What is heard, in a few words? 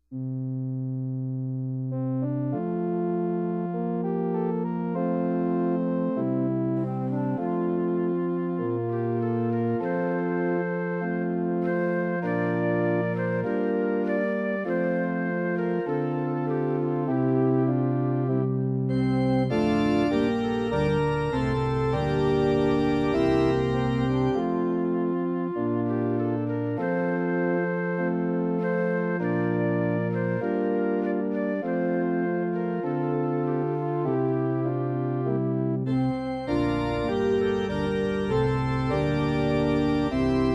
Lenten Antiphon, choir; Behold The Lamb